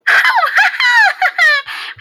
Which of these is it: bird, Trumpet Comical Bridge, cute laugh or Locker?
cute laugh